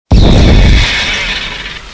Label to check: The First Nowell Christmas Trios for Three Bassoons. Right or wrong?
Wrong